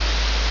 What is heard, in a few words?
ambience room tone